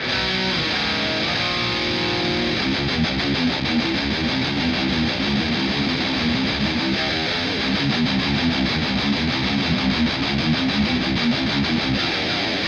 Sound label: blade attitude cab